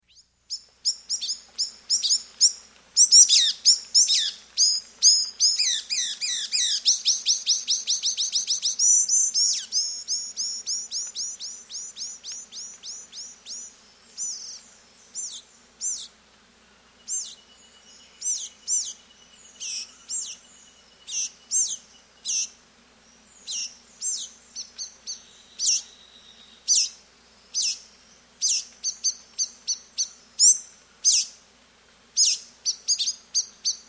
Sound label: Olive Tanager; S. America